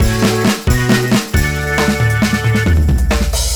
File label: Transition